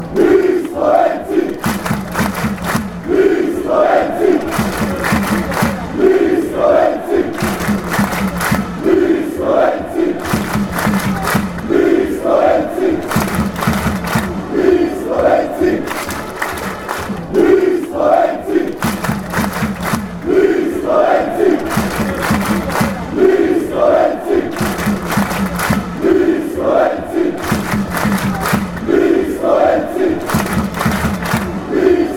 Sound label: slovenia chant